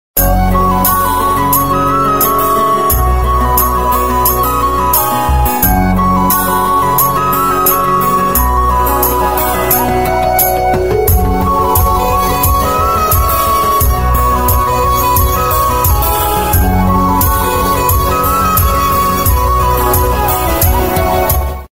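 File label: Tamil background music Ringtone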